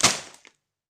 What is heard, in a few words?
ranger multishot; Adventures in Lestoria; action RPG game; Sig Productions